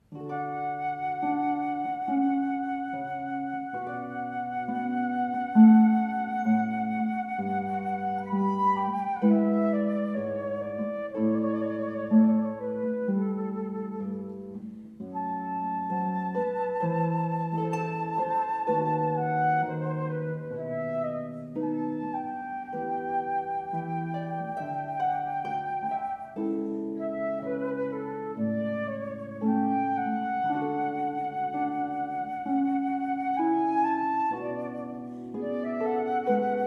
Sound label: Flute And Harp; Air on a G String; Classical